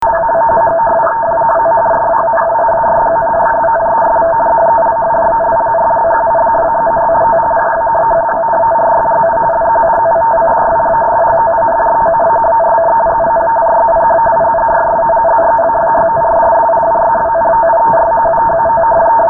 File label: rtty